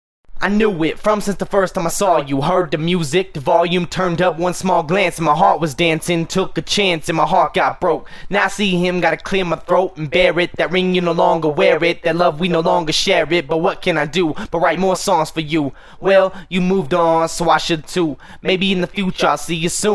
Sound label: acapella